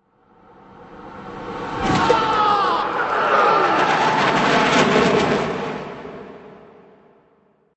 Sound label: War Sug; red cross eru